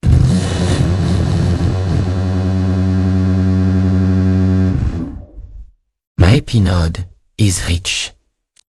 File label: jingle